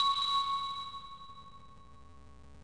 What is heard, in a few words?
brick; stardunk ios gamerip